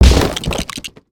Present Smash; creatures mechanical

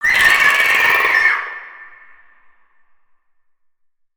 Spider Boss Baby Scream; creatures mechanical

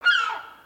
Seagull Call; creatures organic

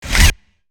Robot Move Arm Up; creatures mechanical; Lego

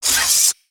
Spider Boss Fidget; creatures mechanical

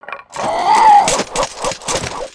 Basic Spinjitsu, creatures organic; Skullken; aronwk collection; ndaudio

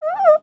Kitty Cat Mmm; creatures organic; Backpack

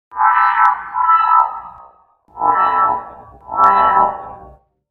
Robot Idle Scan; Lego; aronwk collection; ndaudio; creatures mechanical

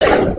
hit, creatures organic

